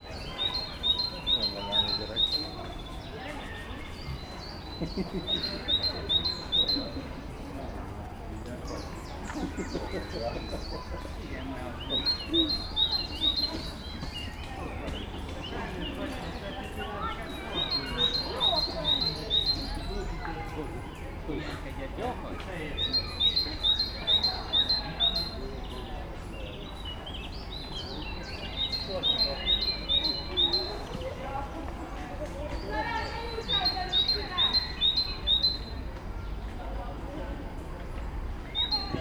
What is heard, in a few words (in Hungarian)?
szabadon levő, erdei pinty; allathangok; veszpremizoo 2013; akg